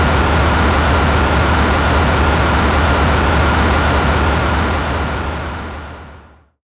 solar ambience; Space Captain Yanya - Original Soundtrack

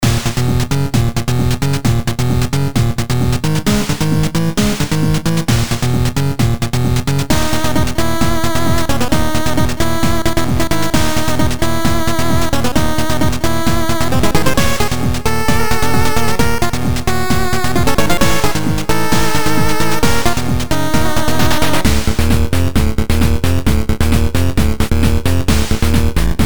8bit jrpg boss battle

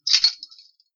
key pickup

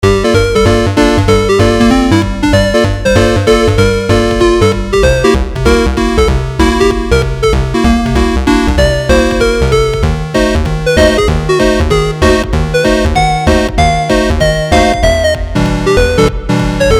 Bebop

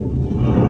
move boulder

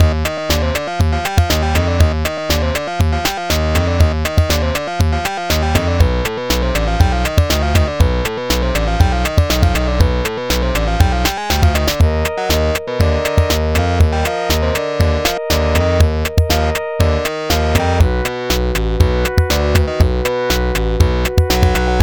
Bonus Level

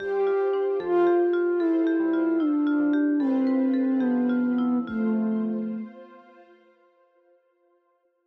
Game over jingle

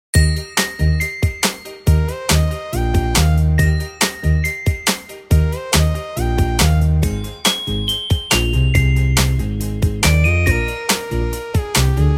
soundtrack

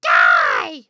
Minion Attack

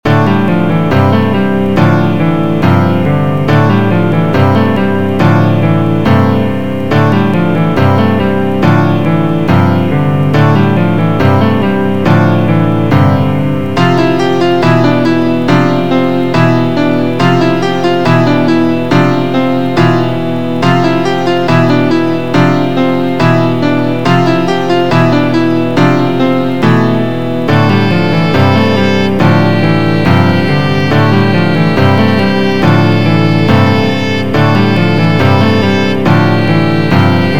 dramatic loop

